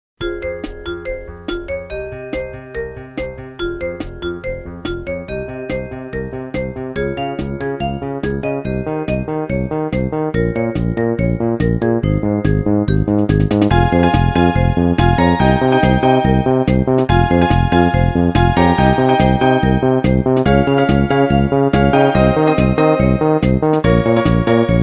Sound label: nokia ringtone tumbler; נוקיה 3210